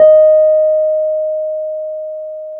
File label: PNO, Wurly